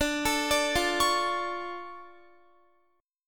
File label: D Minor Major 7th D down Guitar; DmM7; arpeggiated